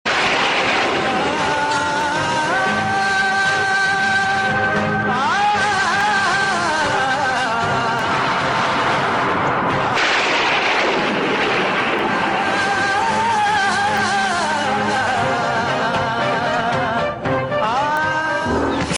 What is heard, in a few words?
BG Tone; dharti ka veer yoddha prithviraj chauhan